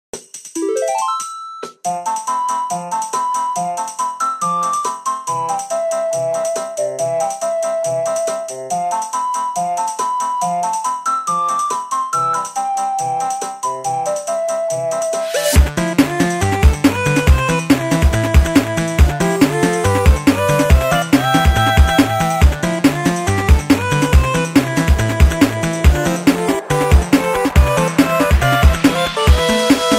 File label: dubstep